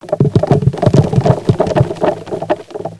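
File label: plastic, instrument; INSTRMNT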